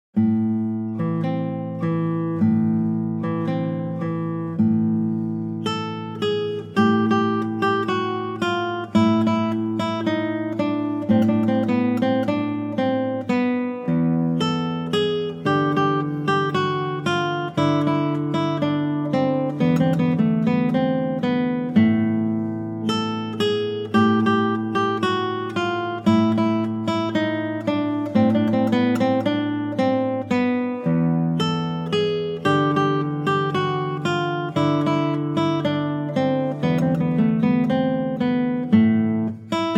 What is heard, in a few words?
Habanera, Konzertgitarre